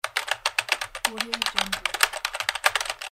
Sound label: typing keyboard; dimashiko